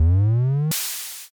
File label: compressor